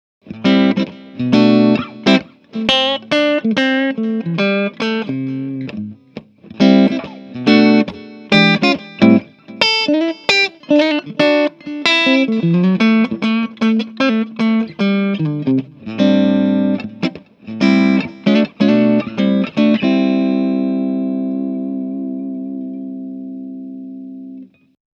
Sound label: clean